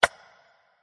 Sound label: Click Building